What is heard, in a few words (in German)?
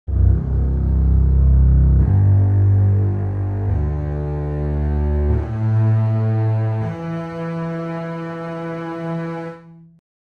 Kontrabass